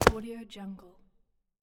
warehouse concrete floor footstep; stormwaveaudio

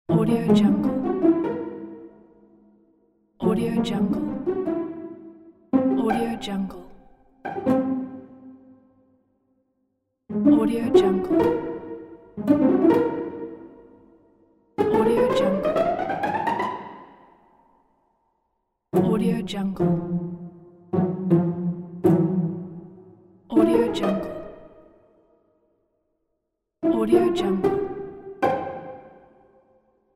cartoon sneak on tiptoe; eytan-k

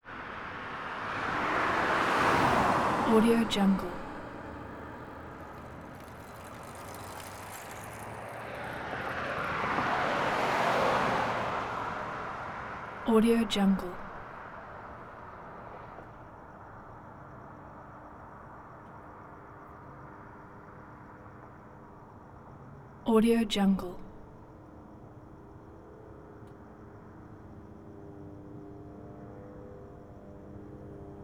las vegas neighborhood

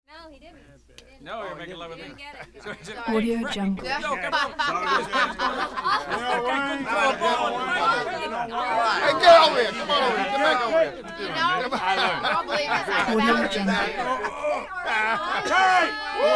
bar walla constant rowdy conversation hollering

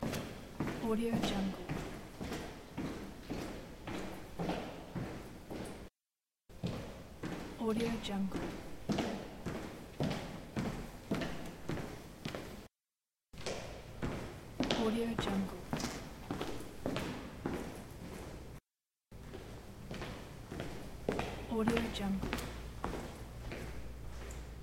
directional footsteps in hallway

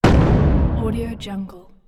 small explosion; ndeemax

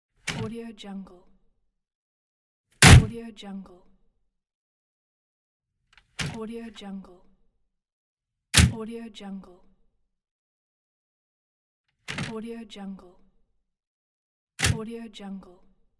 car hood

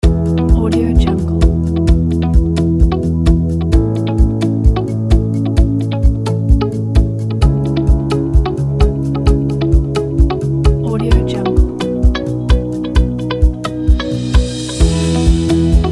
travels animals; brownbird

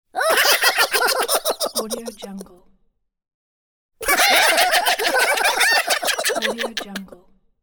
short cartoon character group laugh

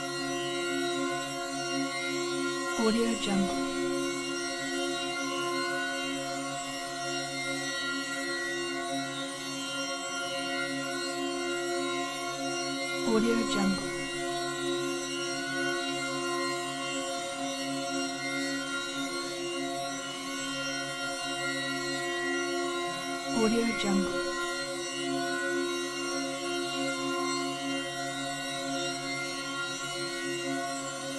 heavenly dissonance drone; lokohighman

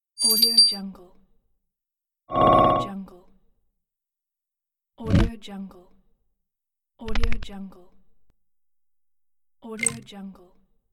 dropdown list sounds

